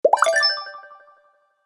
Flash sale pop up; black friday

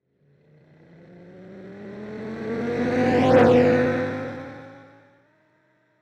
Sports car passing by fast

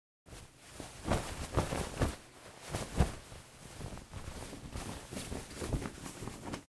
Clothes shorts movements walking

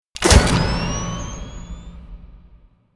Stadium spotlight hit arena entrance

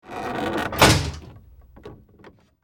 Ship door hatch close

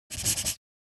Handwriting signature paper contract

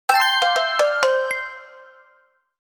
Game achievement success; gfxsounds

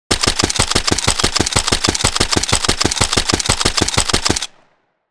Heavy machine gun automatic fire long; guns

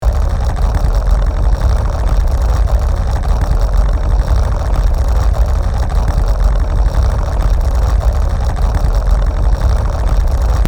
Machinery gears rattle loop; gfxsounds

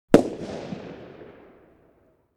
Fireworks single explosion shot

